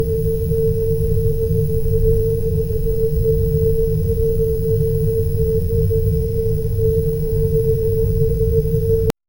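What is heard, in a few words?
seamless lunar surface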